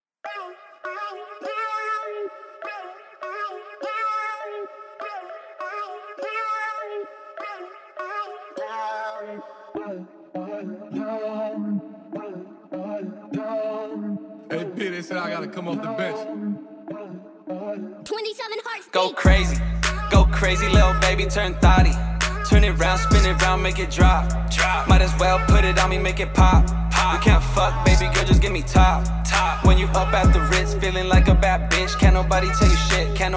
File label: HIP HOP